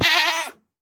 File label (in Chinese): 山羊受伤声